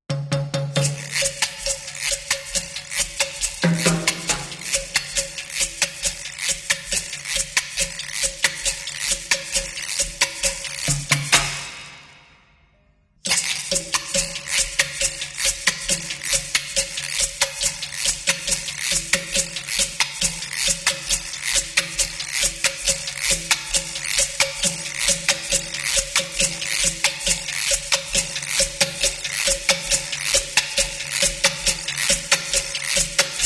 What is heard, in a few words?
Percussion